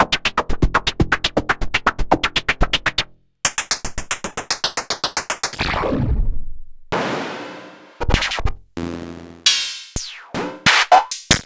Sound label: Hat c